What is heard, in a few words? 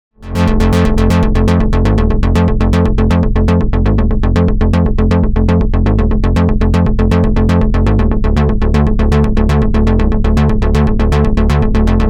Pseudo Duophonic